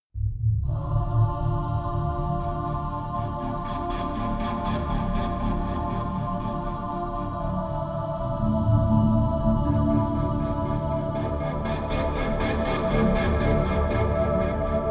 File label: tense enigma